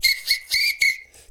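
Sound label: foudang groove; Best Service; World Colours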